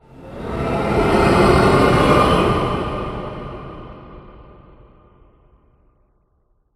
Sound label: Ghost; horror and atmosphere fx; Silent Hill Downpour